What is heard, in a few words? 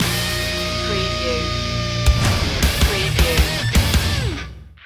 firestorm stinger